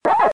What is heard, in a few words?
waka; pacman